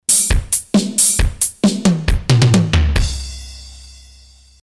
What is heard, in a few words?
drum